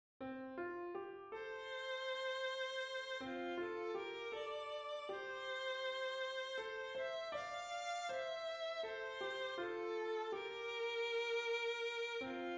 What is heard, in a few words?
melody harmony; Eleanor of Usan